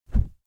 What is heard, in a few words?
wing flap